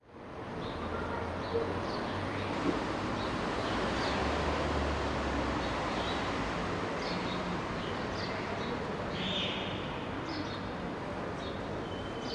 berlin backyard kottbusser tor adalbertstr city rumble cars and train in distance birds in; fvtt sonniss gdc audio